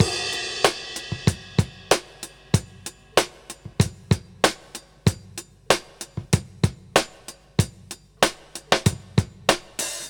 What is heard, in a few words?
Todd 095; Zero-G; Monster Beats